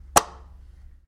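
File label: button click, noisy, sharp, close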